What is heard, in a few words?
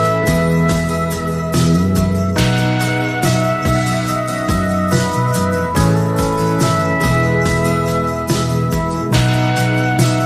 uplifting acoustic; Music